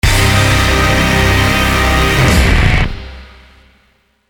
Defeat; Forged Battalion

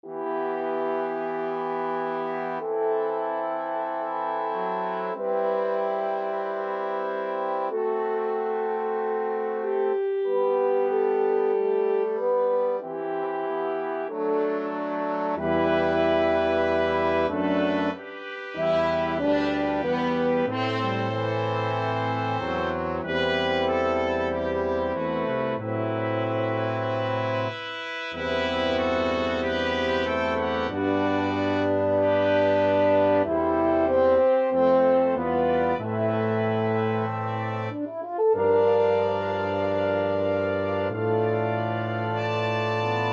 Chorale, Brass Choir; Chamber

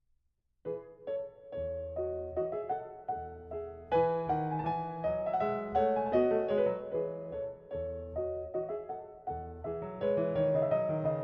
French Suite No.6 Gavotte; J.S. Bach; French Suite No.6, BMV 817

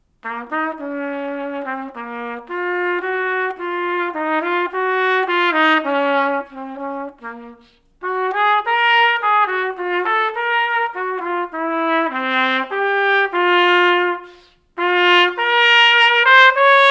Romance in C Minor

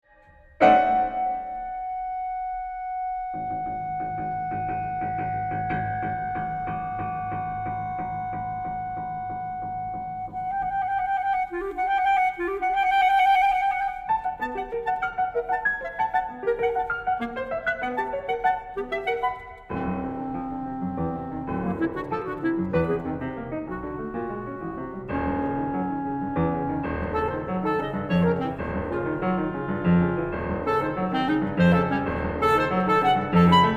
Clarinet Sonata Presto agitato

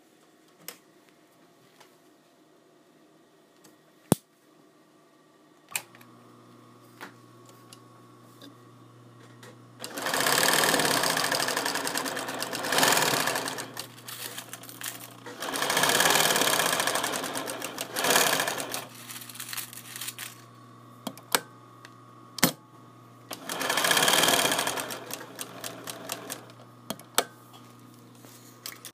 Machine

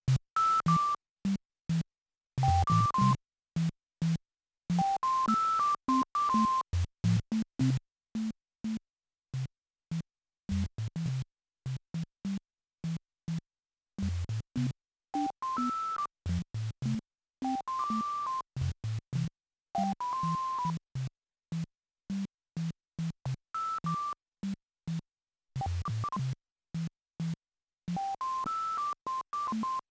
midnight whistle

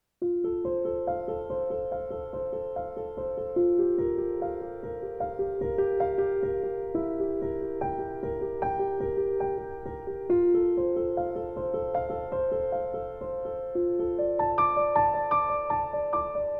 Hopeful Piano

